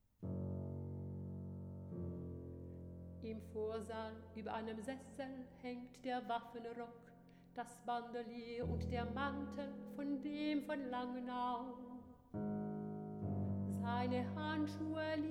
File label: CORNET